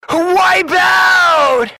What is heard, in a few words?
switch wipe out